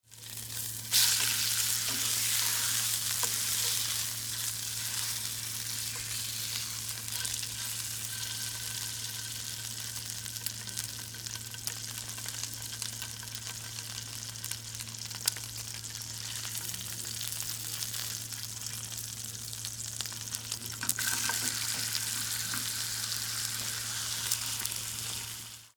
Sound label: grilling meat